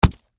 Impact Punch Flesh, Hit; Punches and Hits; Combat

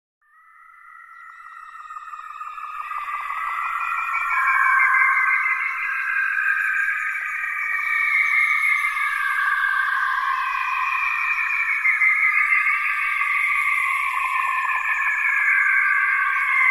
Eerie alien calling sounds ambiance.; Alien Calls; Aliens

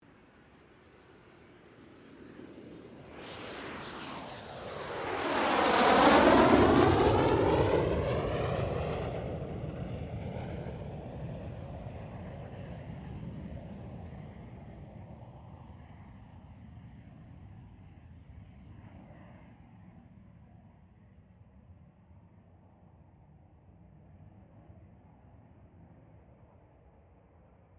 AIRCRAFT CA-27 SABRE PASS STEREO

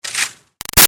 Arrow By Airy Fast; Airy Shimmer; Bows and Arrows; Combat

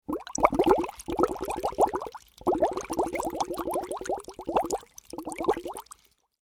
Water Bubbles Straw Blow; Nature

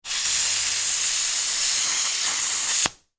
Industry Air Compressor Release Long; Air Pumps (Sound Effects:Machines)

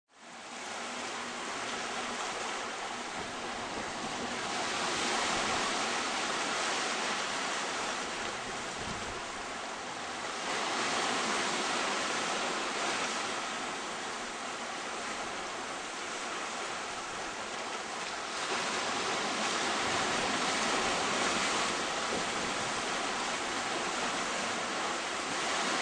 Beach Waves Coming In On Rocks; Light to medium water waves lapping against rocks and sand on a small beach; Lynne Music

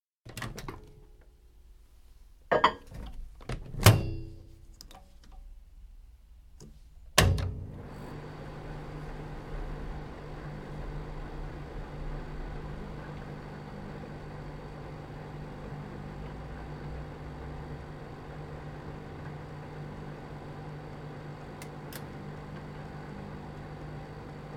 Microwave oven loaded, operated & emptied; Appliance; BBC

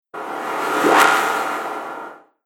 Whoosh Laser Projectile; Whoosh Sound Effect

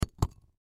Bowl Set Down, Bowl Set Down Glass Table Dish; Dishware; Household; Blastwave

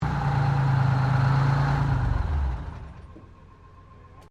Truck Kenworth 12V71 Shutdown, Old Kenworth truck

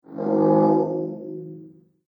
Alien Warning Sound

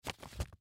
Envelope Handle; Office; Blastwave

